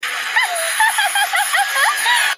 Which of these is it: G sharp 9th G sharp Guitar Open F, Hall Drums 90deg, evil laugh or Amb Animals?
evil laugh